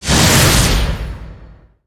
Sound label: Punish Totem Wave; Summoner